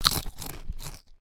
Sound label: food Eat; Kerbal Space Program; KIS